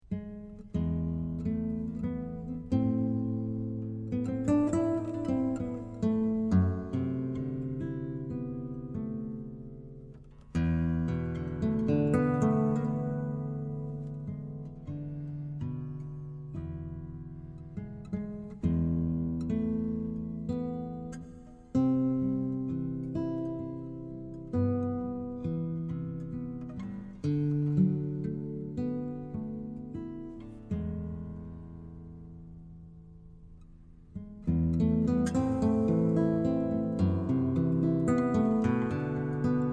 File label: nylon